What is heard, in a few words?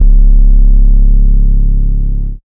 808 (C#); drumkits; fl studio; bvker drumkit; Born 808